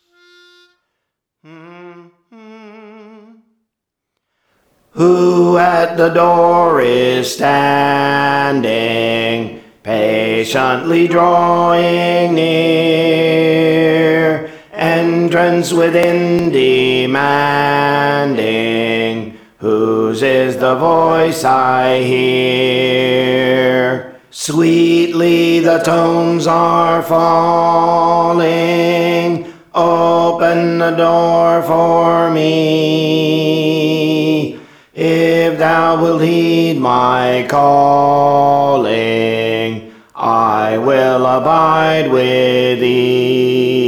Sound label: Alto; Praise For The Lord Training